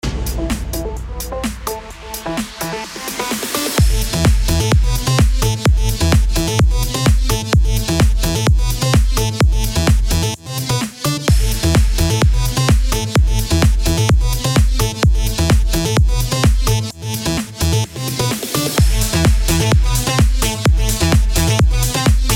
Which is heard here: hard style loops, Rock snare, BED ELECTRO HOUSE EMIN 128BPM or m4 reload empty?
BED ELECTRO HOUSE EMIN 128BPM